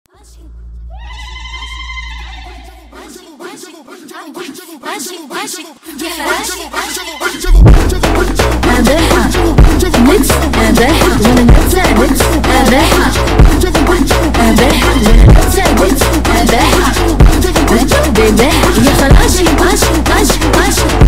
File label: PHONK